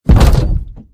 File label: step; goliath